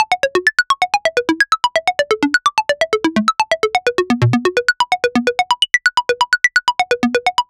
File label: future bass house loop